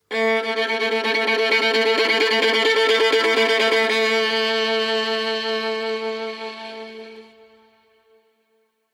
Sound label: Violin Documentary Drama